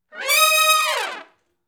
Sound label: Hi Rip Up & Falloff (Eb), horn sections; Crimson Blue and Fabulous Horncraft 4 RnB; Horncraft for R&B